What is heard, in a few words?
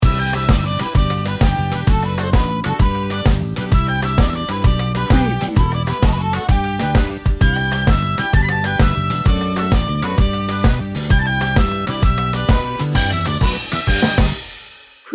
irish jig